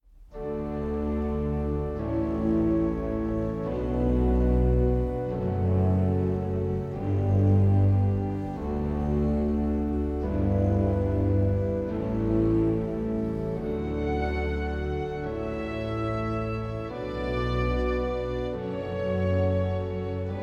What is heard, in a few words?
pachelbel canon in d major